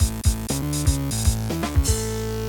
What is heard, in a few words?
award won; server-nalc; awards